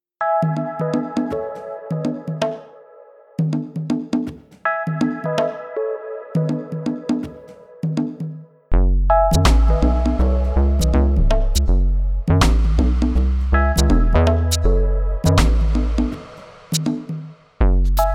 beauty drops wet

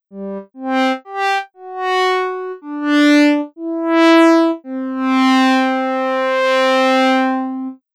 greek dry

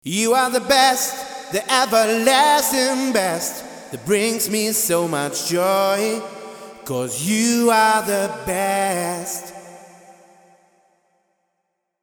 vox male wet variations

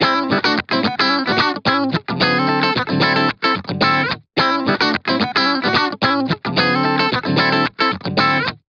Funk guitar dry

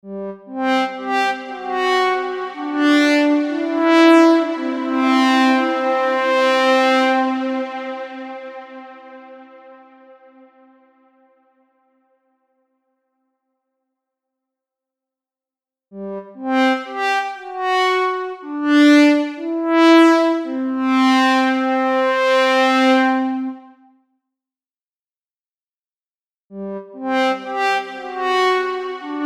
greek 80s wet variations

